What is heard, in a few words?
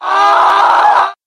monster dullahan